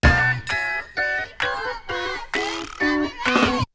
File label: Drumloop; ZERO G - Datafile DISC 3